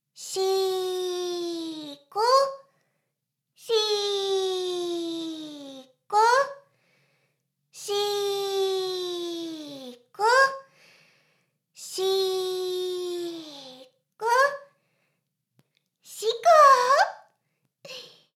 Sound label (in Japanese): メイド シコシコ音声; ASMR; ダメなメイド